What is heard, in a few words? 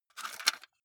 cassette case insert soft; objekte; kassette